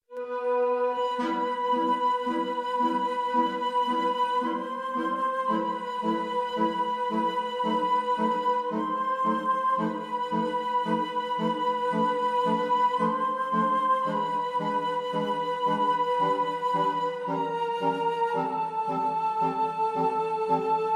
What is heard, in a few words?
Prelude woodwinds; Chopin